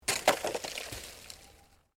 SNOW FALLING FROM TREE BARK STEREO, Snow falling from trees in a mountain forest; Snow Sound Effects; Weather